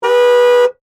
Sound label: Chevy Impala, Transportation, Automobile, Chevy, Impala, Horn, Honk, Single, Short; Transportation, Automobile, Chevy, Impala, Horn, Honk, Single, Short, 2011; Car